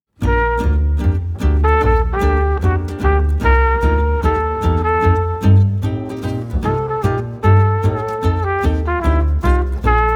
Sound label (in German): hochzeitsfeier jazz love